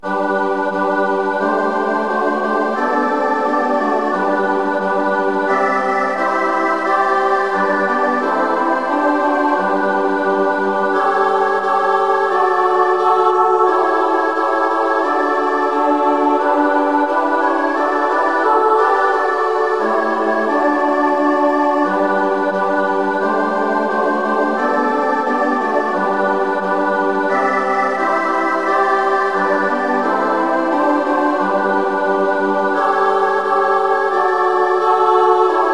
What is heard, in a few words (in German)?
vater unser chorpartitur